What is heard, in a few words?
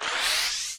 noise; MAGIX; EFFEKTE